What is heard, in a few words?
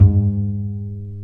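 String, contrabass pizzicato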